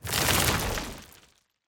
seed combine; easter

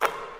swish; 2fast2curious

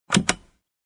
button push

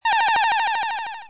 phase change; doublespeakgames

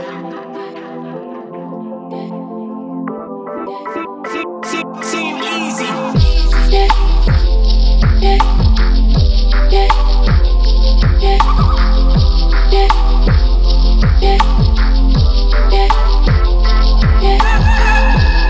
ANIMALS BEAT

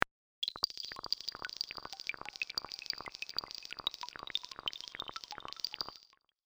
Fish roll

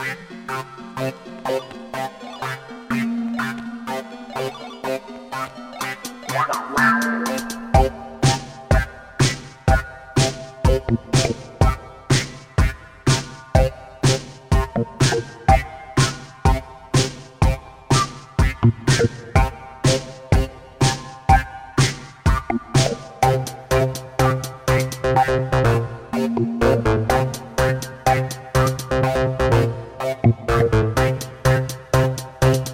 Electrofunk; Flashpoint; shockwave; extremecabbie

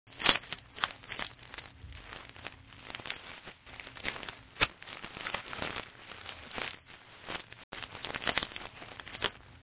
jiraffes, animals; Duplo Zoo

